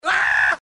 DIE, flesh n scream; hl2dm; oppressive territory

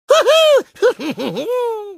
dance; tf; crash

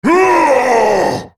ultimate effort; Volibear

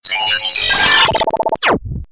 eyebot death alternate; freak fortress 2; fallout new vegas

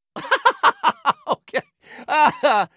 laugh evil; Borderlands 2; Jack

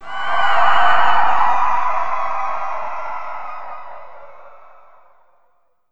scared breathing; hl2dm; town

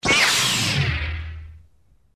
saber; Dark Vader

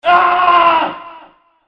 scream

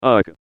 pain sharp; John Madden

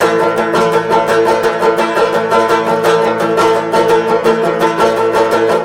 banjo; Left 4 Fortress; zombat

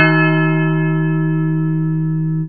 Bell

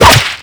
hit; slender; isabelle

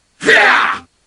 hit hya; superheros; ironman

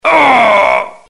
grunt med; tf; max payne

